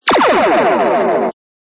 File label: Laser gun